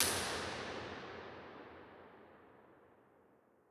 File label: Real Places Cellar R; Impulse Responses; Norlac; Ableton Live 12 Lite; Hybrid